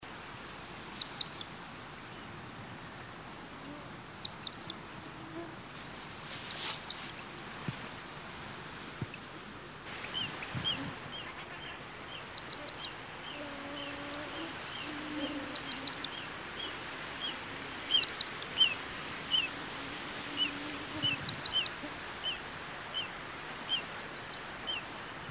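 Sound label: perevozchik golos, common sandpiper voice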